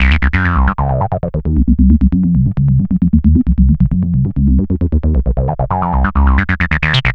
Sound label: Synth Bass; Basses